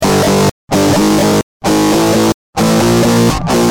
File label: G Jam, Guitar; Industrial